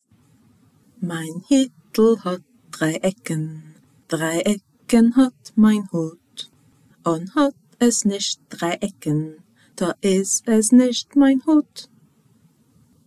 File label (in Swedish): Min hatt den har tre kanter, Jiddish